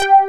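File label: ANALOG BASS; Pro-Rec - Synthsonic; BASSES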